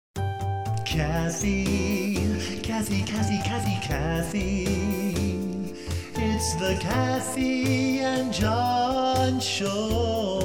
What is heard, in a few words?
Show Jingle